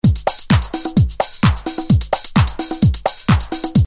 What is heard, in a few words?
OLD HOUSE, beats